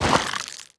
arthopoid pops move; Stellaris

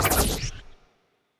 evasion buff projectile, weapons; Stellaris; biogenesis

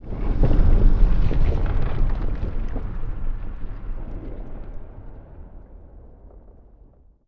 ice asteroid; ambient; Stellaris

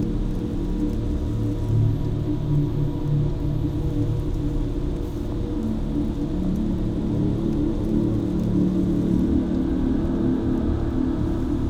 ship battleship idle; Stellaris; toxoids; ships

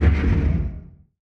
distance shield hit, weapons; Stellaris

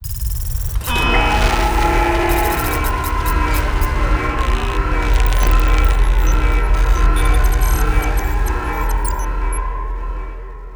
worrying signal; Stellaris; nemesis

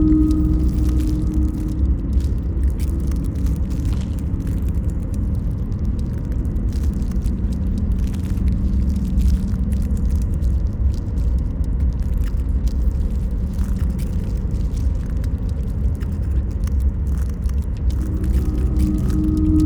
ship starbase idle; Stellaris; biogenesis

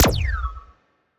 healing muzzle, weapons; Stellaris; biogenesis

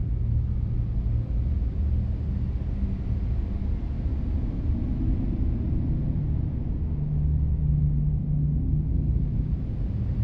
habitat idle; aquatics

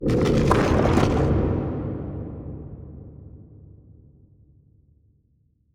barren cold; ambient; Stellaris

